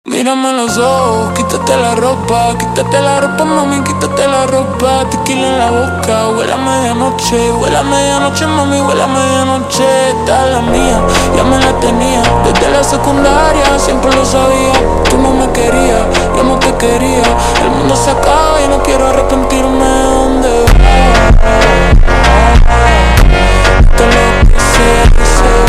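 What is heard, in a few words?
super Slowed, phonk music; funk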